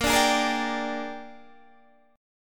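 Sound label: A sharp 13th chord, Guitar, Half Step Down tuning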